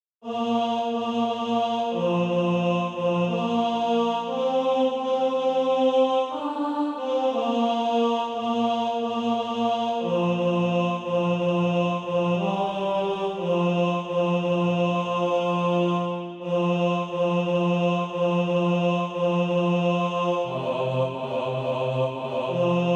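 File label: tenor, SATB Practise Music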